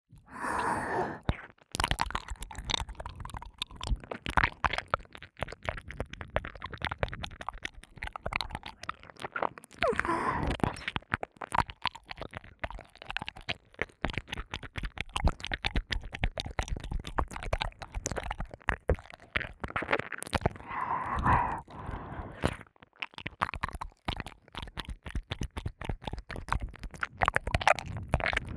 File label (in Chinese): (右)舔耳 不断挑逗; 舔舐声特化; 双耳立体声